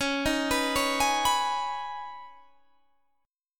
C sharp 7th Suspended 2nd C sharp down Guitar Standard; C#7sus2; arpeggiated; JGuitar